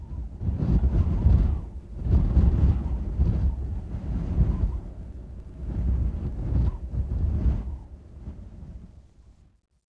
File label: ext wind, ambients; arkane; stonehelm paokai